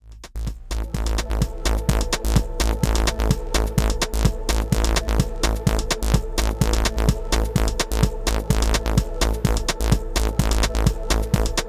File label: techno dry